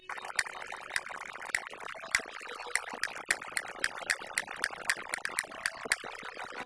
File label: Castanets Violin Applause